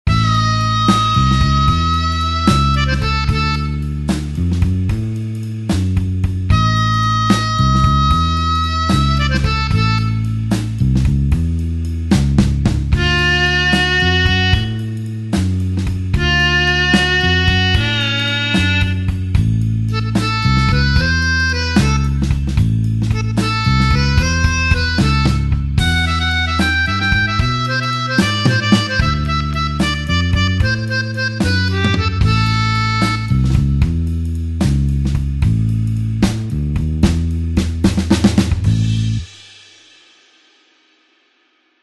blues harp slow A tempo

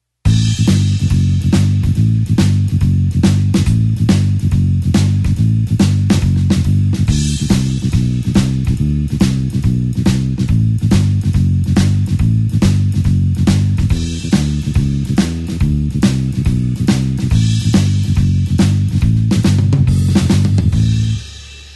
blues bassline shuffle A

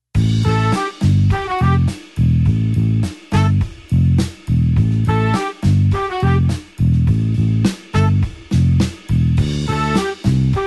blues horns 8 beat A

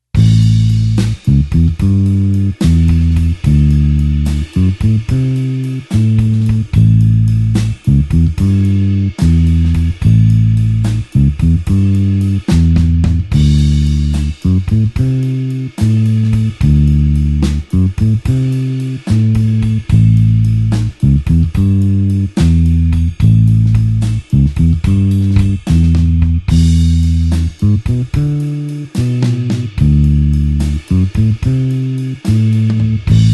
blues bassline slow A

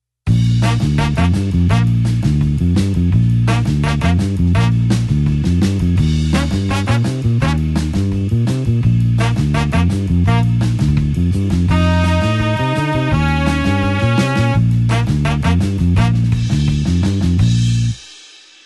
blues horns 8beat Bb

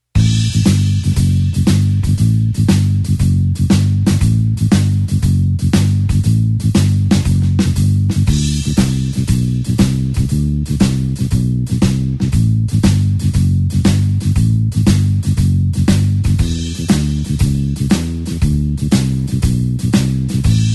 blues bassline shuffle A

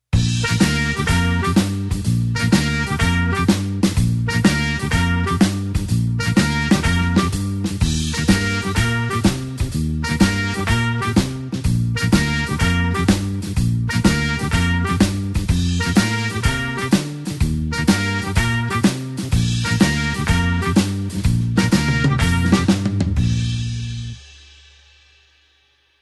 blues horns shuffle Bb

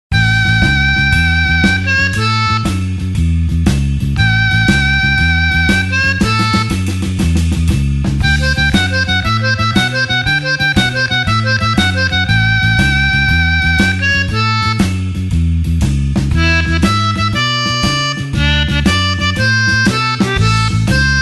blues harp shuffle A

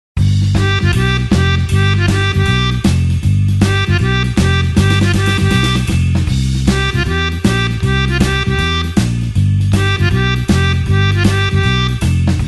blues harp shuffle G

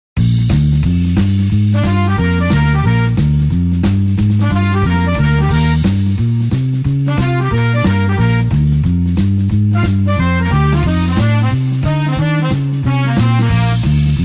blues horns up Bb

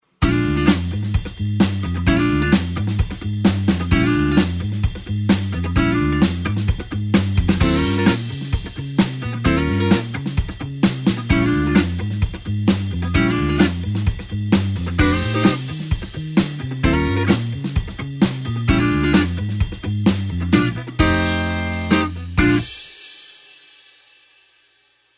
blues 8beat backing chord

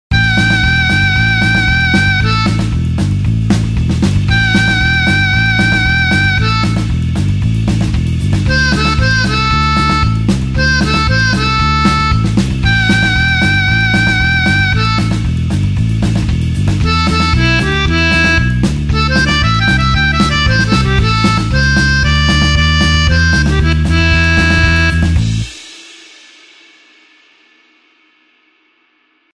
blues harp 8beat A

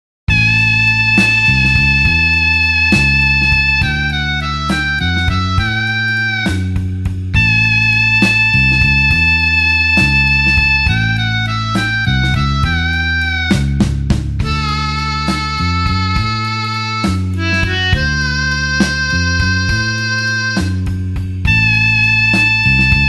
blues harp slow A

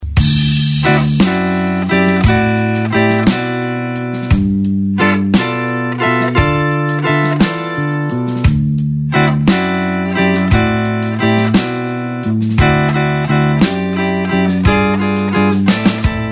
blues slow backing up

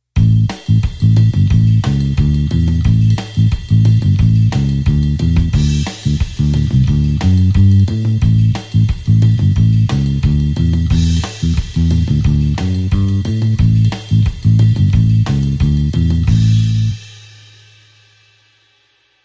blues bassline 8beat A

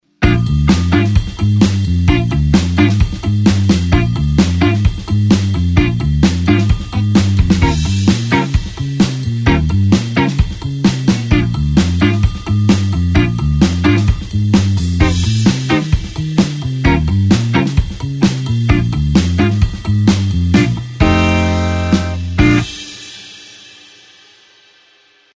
blues 8beat basic pattern Bb

